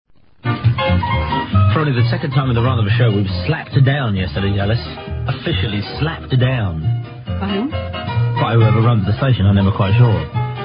jingle

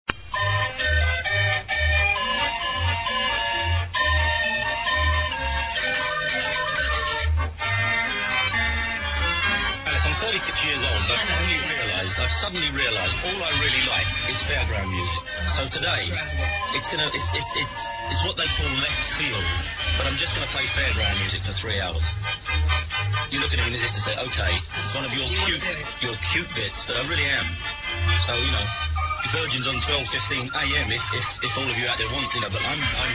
organ

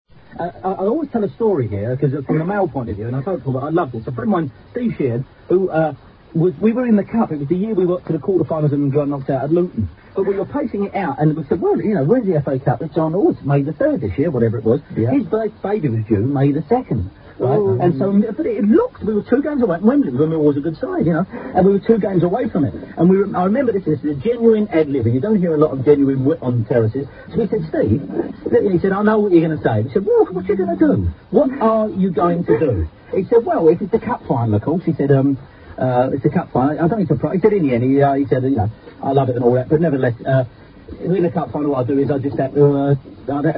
baby

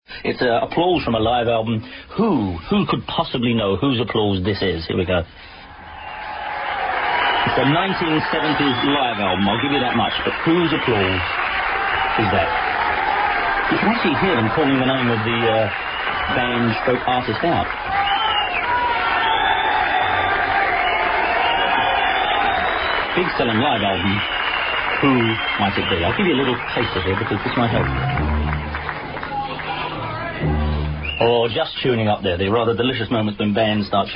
applause

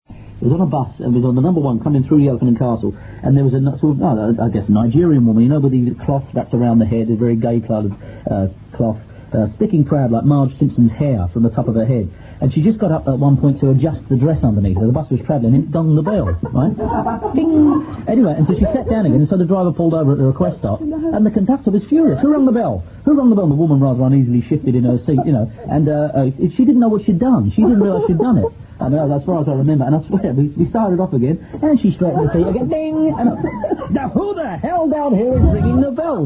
bell

